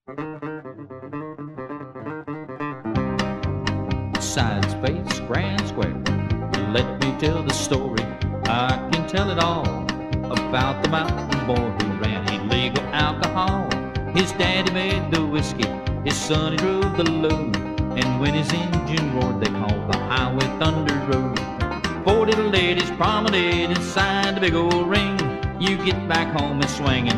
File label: vocal